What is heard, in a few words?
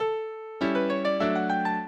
minuet, mozart